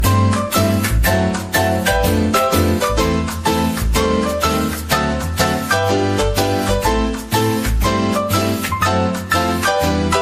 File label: cartoon tech ringtone